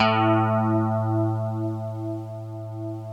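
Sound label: STRAT CH A2, CLEAN GUITAR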